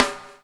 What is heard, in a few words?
BUBL, BUBBLE; Spectrasonics - Backbeat